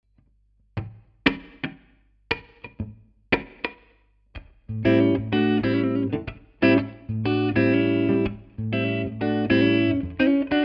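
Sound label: classic clean neck, guitar pickups; schwarzbach; HB59-Classic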